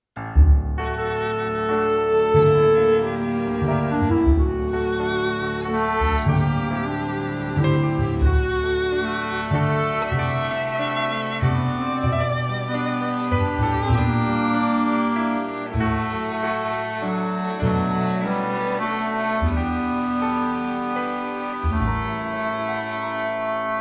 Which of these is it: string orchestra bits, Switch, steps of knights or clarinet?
clarinet